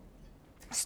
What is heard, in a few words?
Birds